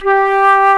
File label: FLUTE G3; E-MU Formula 4000 Series Vol. 5; Protozoa; Proteus2 Instrmt